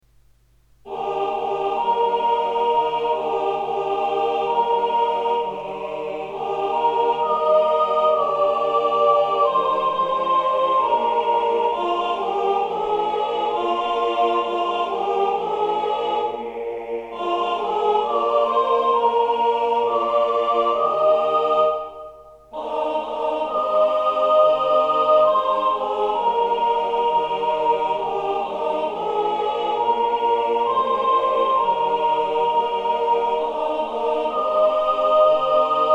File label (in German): wiegenlied soprano; Chants; Wiegnlied (Brahms)